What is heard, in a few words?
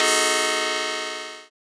cymbal stack, analog